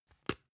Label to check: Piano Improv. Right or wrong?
Wrong